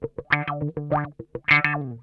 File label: OUCH, Funk Guitar; Zero G - Funk Guitar